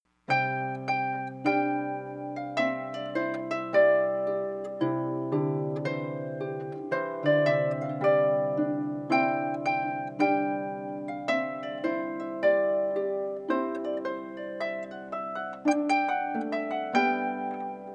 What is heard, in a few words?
Trumpet Tune, celtic harp; Henry Purcell